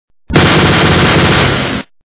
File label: machinegun; egypt; egypt narrative